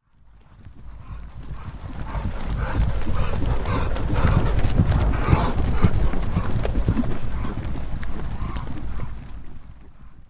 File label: 10 Horses Canter By; Historical; Hollywood Edge Historical Series; War Horses Armies & Weapons